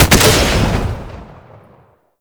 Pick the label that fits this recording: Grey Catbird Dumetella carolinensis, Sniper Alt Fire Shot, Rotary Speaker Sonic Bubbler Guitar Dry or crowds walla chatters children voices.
Sniper Alt Fire Shot